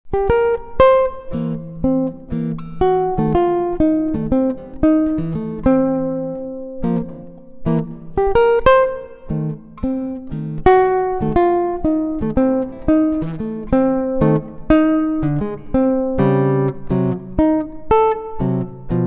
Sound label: Blues